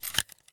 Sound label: generic drop, knife; Escape from Tarkov